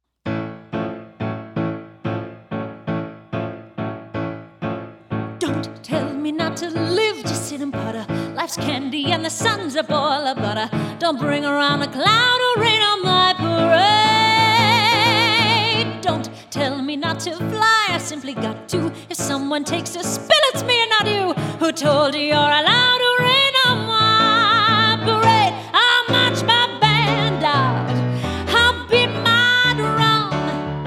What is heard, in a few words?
Piano Version